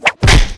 attack

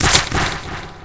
disguise attack

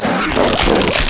boss claner attack

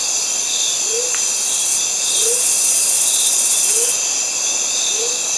grass bug

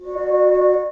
whisper move

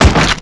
piranha attack

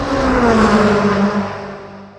fast

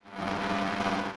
hornet move

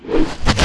shadow stab

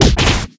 fatal shadow crow

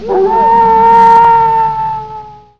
wolf die

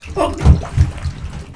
die; antonio

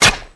hit

